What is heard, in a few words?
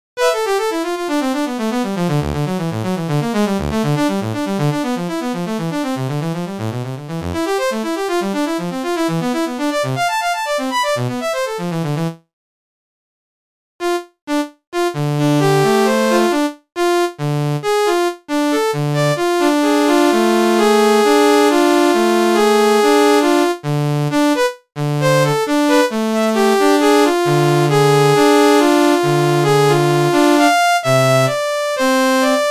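Saxophone, electronic keyboard; MIDI file of a Bach piece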